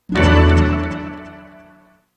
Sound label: Trophy Fanfare; ssbm